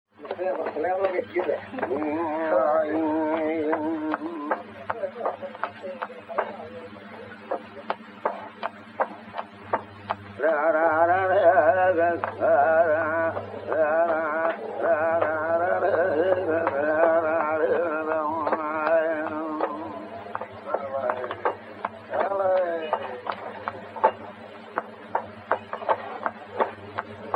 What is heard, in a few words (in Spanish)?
Soleares